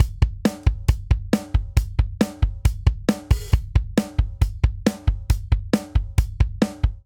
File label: Motorik Verse 136 bpm; 4 4; MIDI Clips; Ableton; Factory Packs; Drum Booth